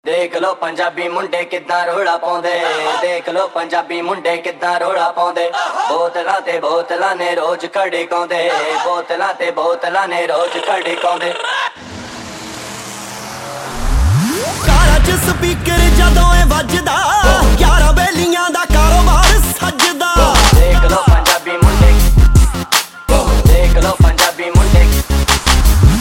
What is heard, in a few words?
Punjabi